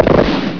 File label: AUTOMAT; WEAPONS